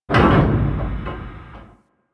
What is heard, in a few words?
Research Facil Crane Side Door Open End; Venus; Objective Rooms; Props One Offs